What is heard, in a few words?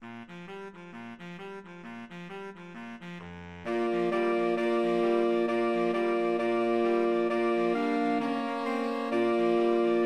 jingle bells boogie SAX quartet